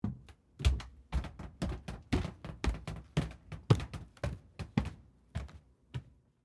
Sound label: Carpet Stairs Up, Footsteps, Carpet, Stairs, Up, Underneath, Perspective; Footstep Sound Effects; BlastWave